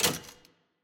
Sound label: Lock Success; Puzzle